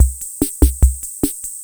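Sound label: ROCK, drum machine; Crypton - DrumBase5000; TR-66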